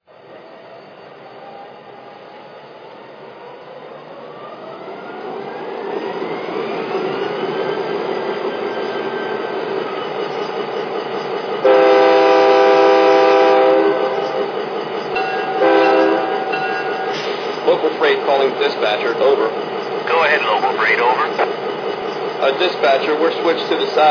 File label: GP30, trains; CP